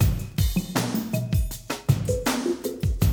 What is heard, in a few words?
D3 BREAK